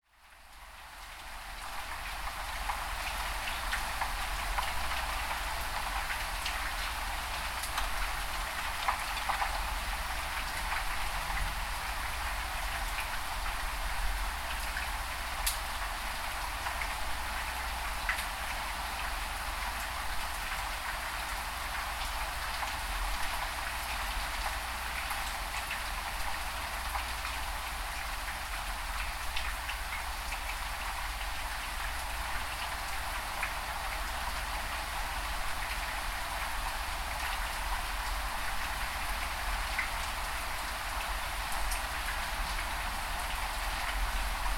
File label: Rain and Thunder Through Window